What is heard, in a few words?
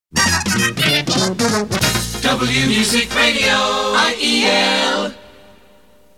jingle